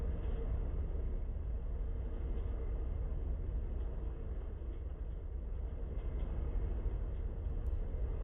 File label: ship ambiance; Lethal Company